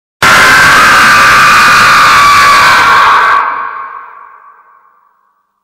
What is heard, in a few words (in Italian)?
urlo mostruosamente orribile effetto sonoro, sounds scary